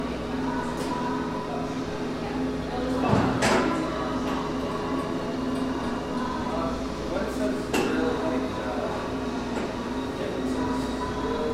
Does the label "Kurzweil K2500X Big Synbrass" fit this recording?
No